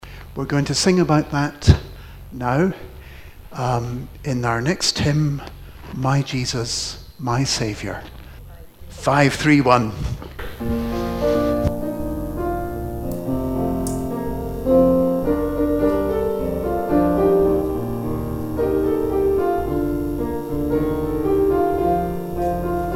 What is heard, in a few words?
hymn